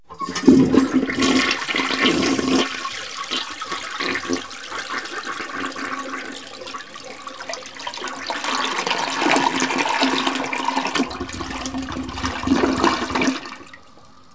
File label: Domestic Toilet, Bathroom; KABOOM! - Wild and Wacky Sound Software; Household